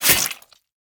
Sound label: sword hit; Original TK Sounds